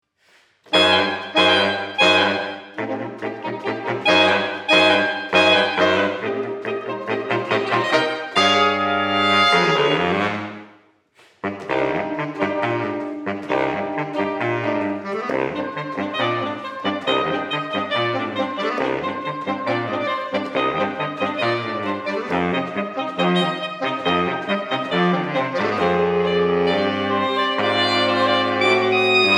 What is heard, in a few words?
Sax